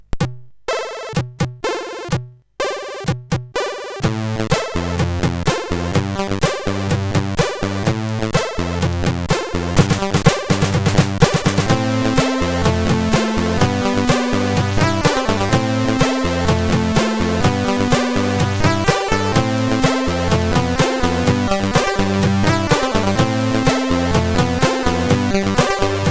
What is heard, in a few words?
Tools menu, sid, CSG8580R5; Swappers